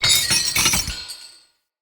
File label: Balloon Chain Detach; Encounters